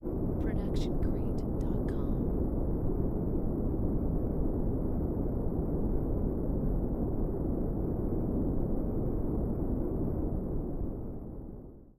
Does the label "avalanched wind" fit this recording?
Yes